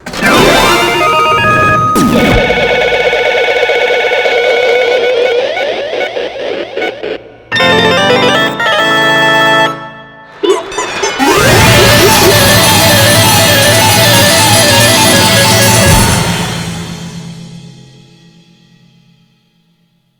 voting slots; drv3

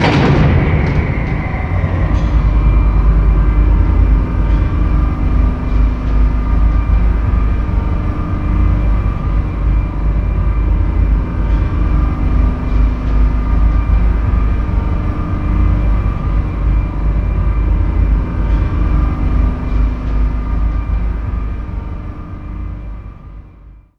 elevator moving, ambience

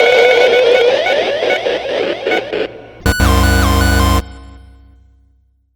voting slots end fail; drv3

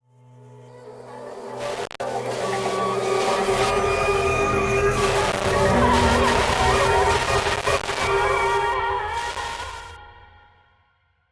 body discovery; drv3

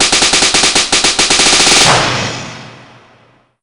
breakdown

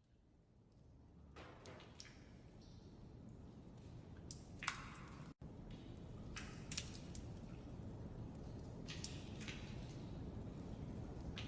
wet, ambience

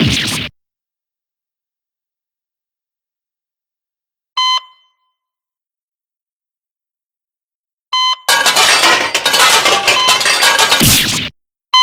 sfx stab

